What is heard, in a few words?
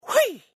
jump; penfold; Danger Mouse - Ultimate